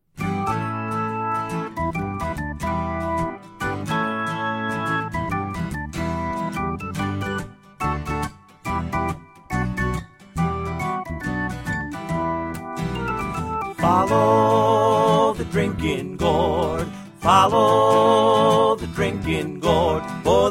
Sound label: vocal; Follow the Drinking Gourd